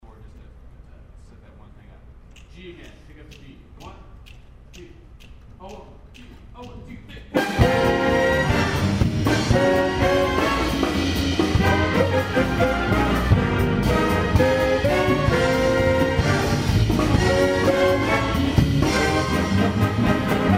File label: Big Band; Foothill